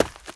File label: step dirt